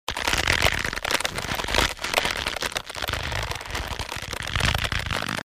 target stretching aim loop

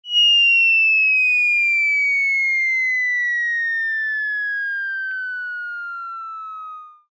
cog bldg drop; Toontown Online

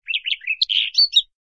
bird

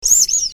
clock

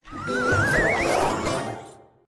toon in whirlwind

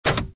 drop trigger box

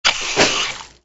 squirt seltzer; Toontown Online

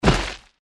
target impact only

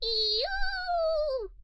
deer howl; toontown-ceiling

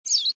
bird

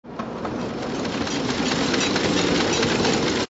trolley away

